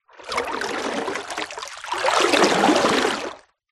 nature water deep movement surface

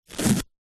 household plaster band aid peel from skin

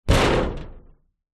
impact metal door slam against

